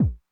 Kick Accent High Tone Min Attack Mid Decay, 909; classic machines

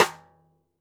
xstick snares on; JazzFunkKit

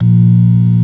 ORG. A#1; B3 FULL

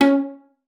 C#4, VIOLA PIZZ; Xsample VOL-5; 90 s Sample CDs

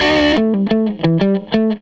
Les Guitar Loop; Sonic Foundry (Sony Creative Software) - Six-String Orchestra

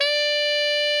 tenor saxophone FFF D5; Greytsounds - Philip Wolfe David Crozier's Saxophones; TENOR SWITCH

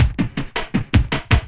LOOP, 160 BPM, Drum & Bass

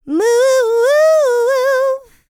LICKS, Vocal R&B Dance; LT DANCE

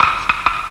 PERC, PERCS KIT; Ragga & Dub Reggae; USB Soundscan vol.27; AKAI

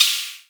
vermona drm1 mk3 hh

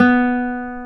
guitar classical guitar, nylon string; Roland LCDP02 Guitar and Bass

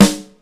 Real Snare, Ensoniq ASR-X Pro

